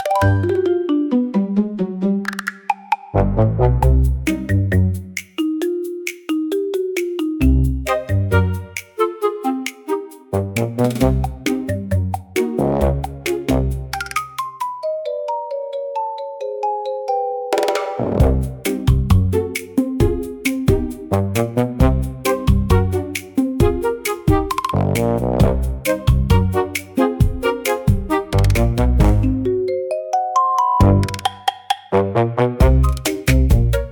Marimba Mischief, comical marimba for funny scenes